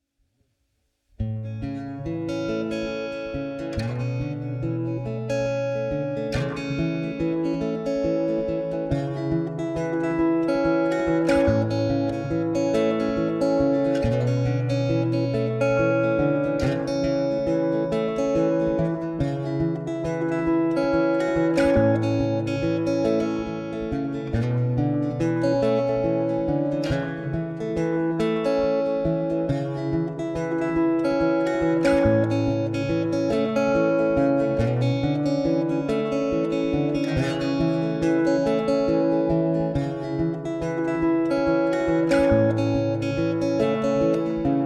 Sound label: acoustic fingerpicking